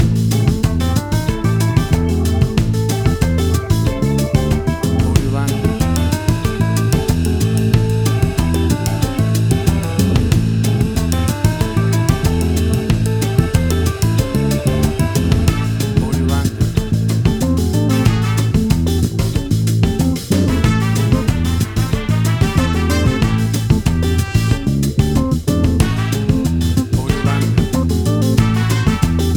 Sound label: Acid Jazz Fusion Groove; A hybrid blend of R&B and Soul. A groov; 60 SEC